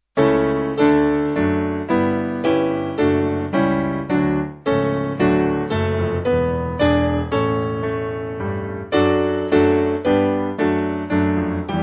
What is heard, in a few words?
piano; Fill Thou My Life; St Fulbert